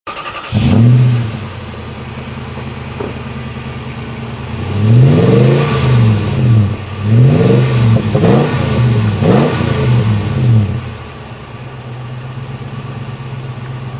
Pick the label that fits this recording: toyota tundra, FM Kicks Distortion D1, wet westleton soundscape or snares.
toyota tundra